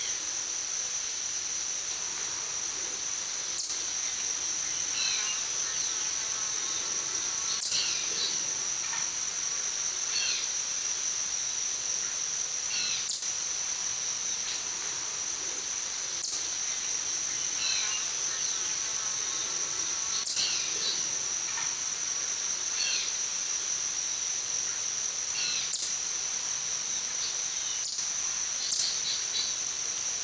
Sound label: ambience; Street Hoops; XBOX